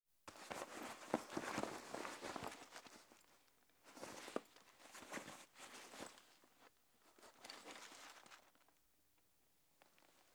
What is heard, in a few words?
Bag Handle Cloth Movement Some Soft Rummage; Foley - Props; Foley Essentials